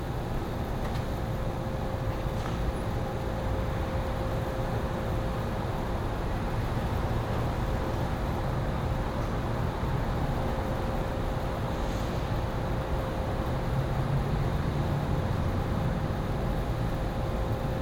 EXT Sunset Distant City Traffic Rooftop Light Machine Hum Birds Stereo; The Road